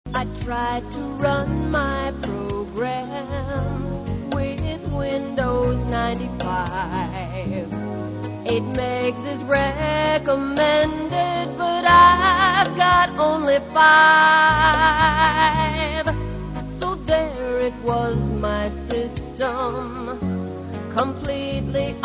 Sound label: win95 song